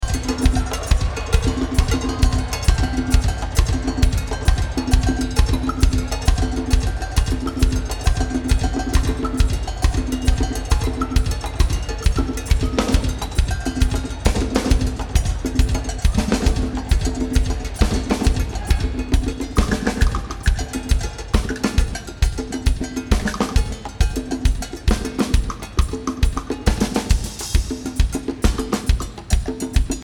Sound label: Drum Jam